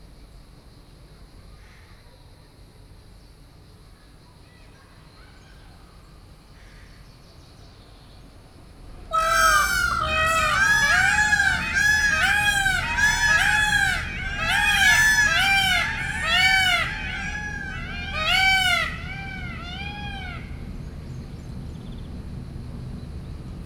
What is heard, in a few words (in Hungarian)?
megijednek a pávák, makryammos beach thassos; termeszetben